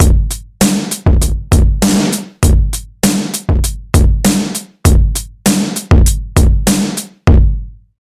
Drums

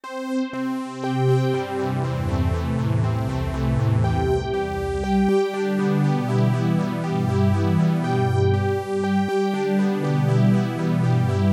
NTS-3 Synth LPF

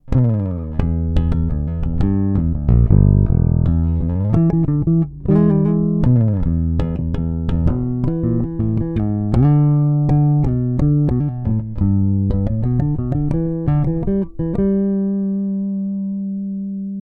Neck Passive

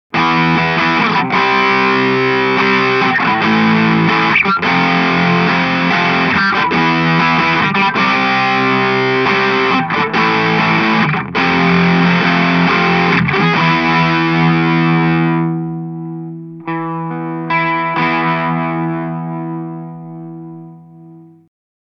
Boss ME-90 Rat DS

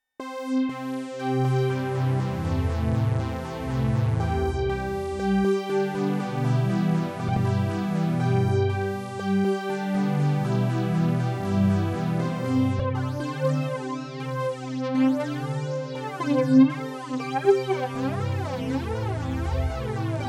NTS-3 Synth Flanger

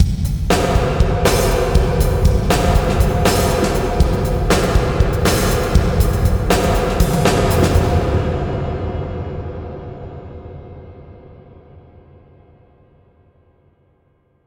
Drums Big Warehouse; Tegeler Audio RaumMaschine

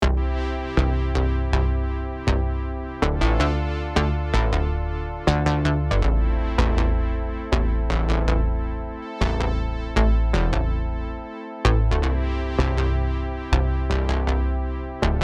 Bass Chorus

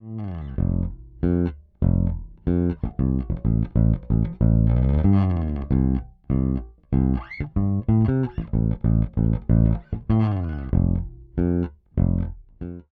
Zoom B2 Four Di 5 Preamp

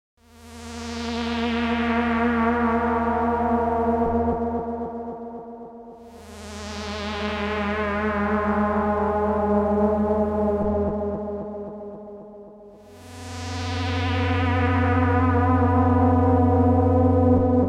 Dreadbox Erebus Reissue

